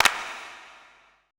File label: E CLAPS, ETH PERC